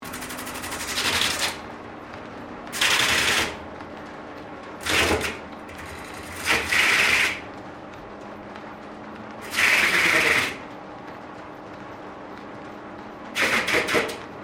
Wheelwright Hub Lathe Operating, Colonial era machinery; Machines